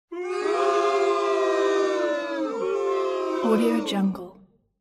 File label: upset crowd